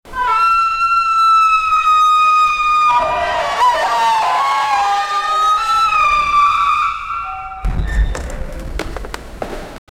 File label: a bunch of people screami